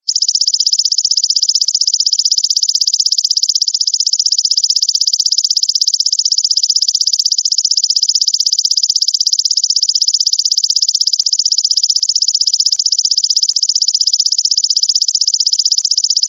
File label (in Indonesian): belalang emas durasi panjang